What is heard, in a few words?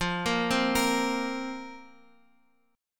F Suspended 4th F down Guitar Open F; Fsus4; arpeggiated